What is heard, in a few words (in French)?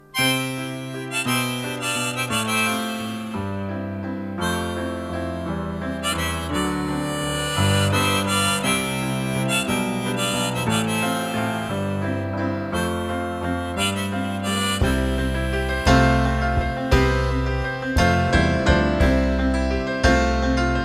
accords